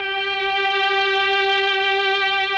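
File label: MELLOSTR, Mellotron Violins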